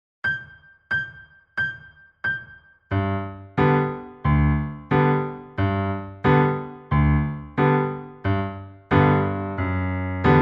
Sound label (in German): Zauberklavier 90 Secondo; Uebungs-CDs; Playbacks; Polly Wolly Doodle